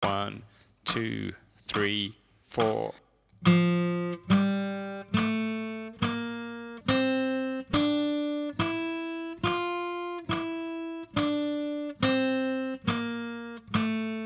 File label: scale f major, guitar